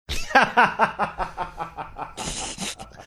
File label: laughing snickering